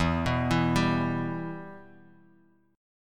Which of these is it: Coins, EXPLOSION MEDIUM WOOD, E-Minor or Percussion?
E-Minor